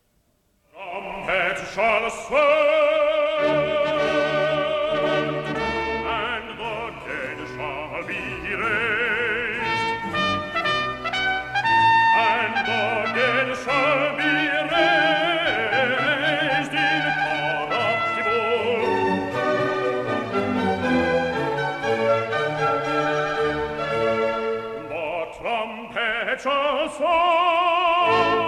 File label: aria